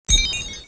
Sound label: beep; Babylon 5 - Entertainment Utility